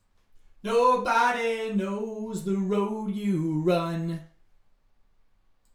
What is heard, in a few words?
vocals